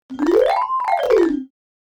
kirarin

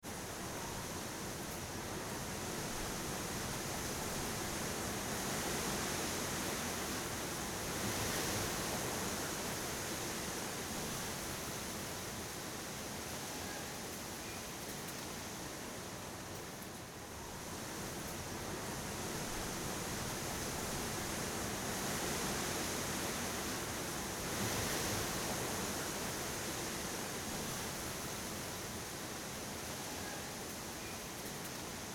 wind

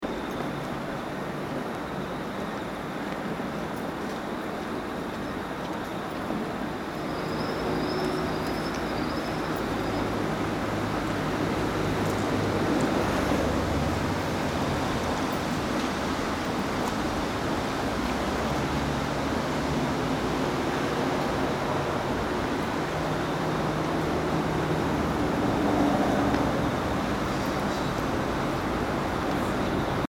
harbor

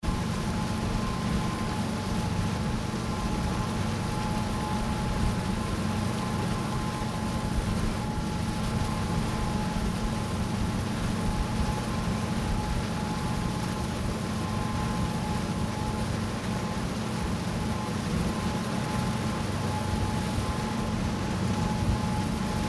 ship

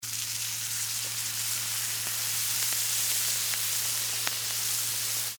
steak cooking

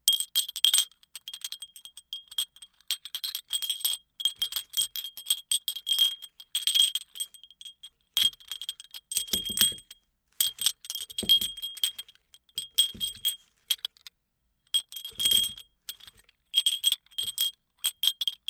test tube

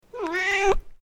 cat

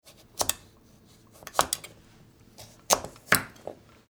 cutting

